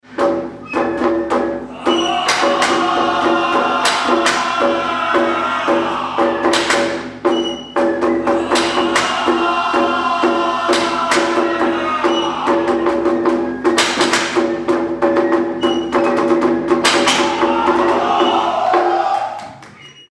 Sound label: Kabuki Theater Male Performance Music Whips Japan; Kabuki theater male performance msc whips Japan; Japanese theater, Kabuki theater in Japan